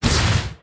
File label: ranged attack; terrorblade